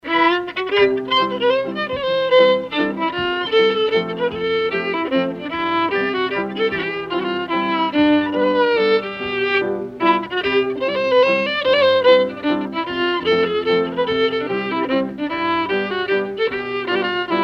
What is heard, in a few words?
Waltz